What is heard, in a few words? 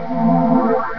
cast; QUAKE